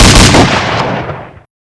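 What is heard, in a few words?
double barrel; z-lapik ru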